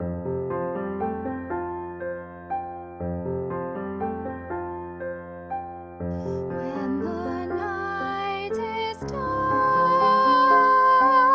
soprano, choir

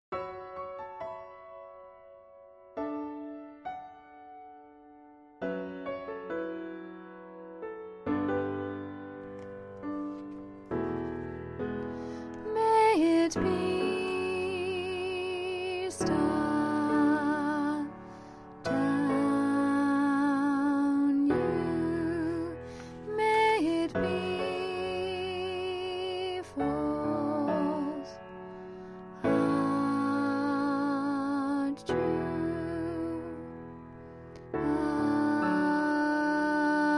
alto

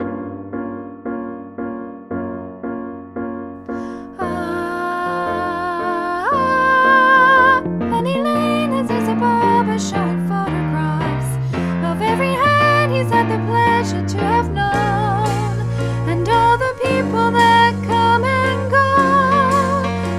alto, choir

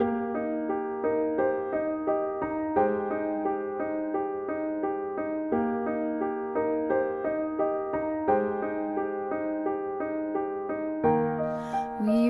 soprano, choir

